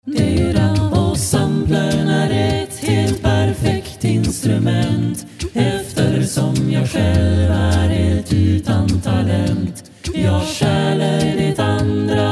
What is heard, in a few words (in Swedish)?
musik